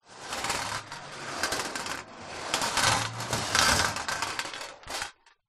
industrial metal ball bearings many roll on metal sheet